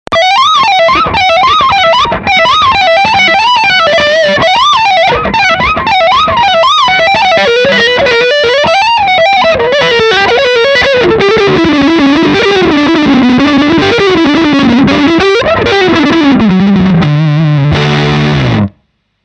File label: Distortion Lead